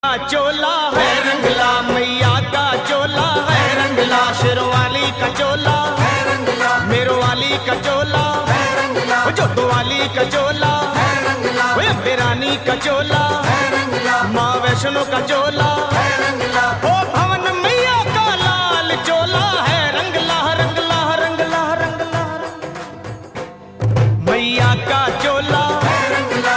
bhakti ringtone

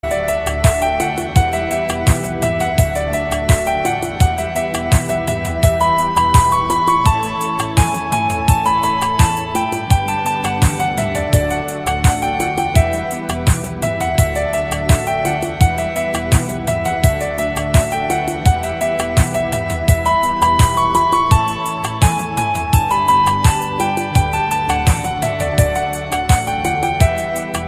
gradual bloom ringtone

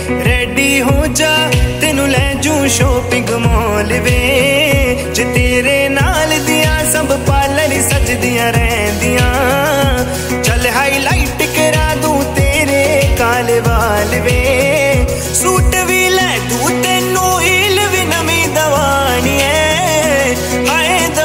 romantic punjabi bollywood song ringtone

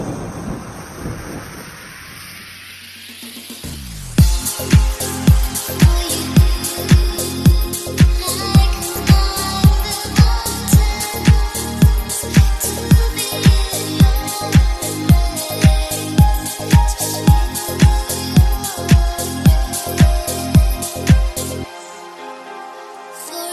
trance beat rain ringtone

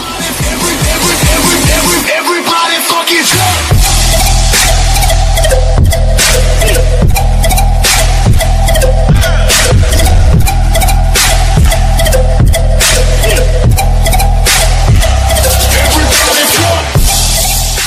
english music ringtone

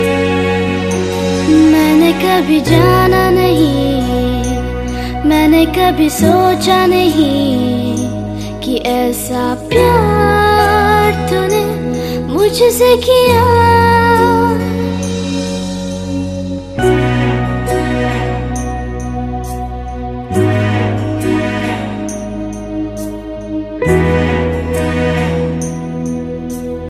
hindi christian song ringtone, christian gospel

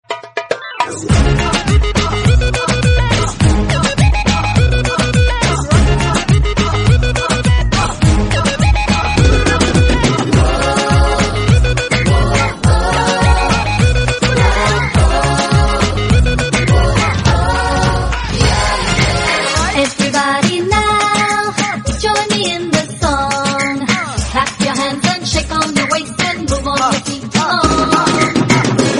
party dance background music tone; nokia ringtones

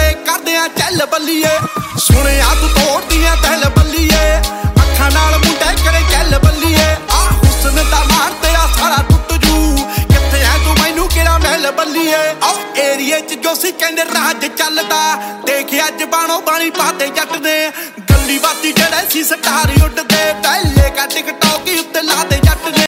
new punjabi song ringtone

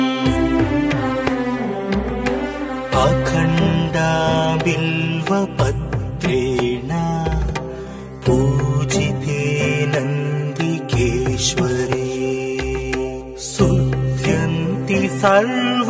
telugu ringtone

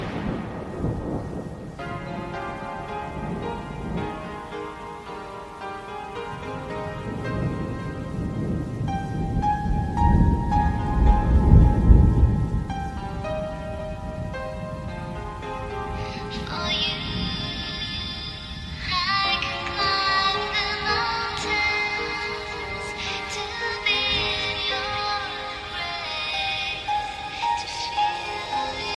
trance rain

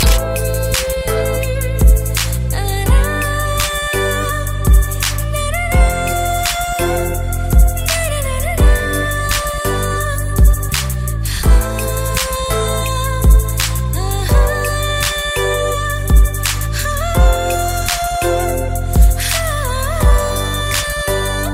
tamil music ringtone

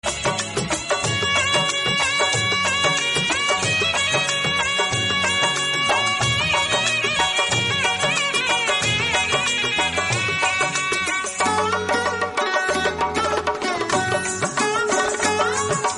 sad instrumental ringtone; bollywood

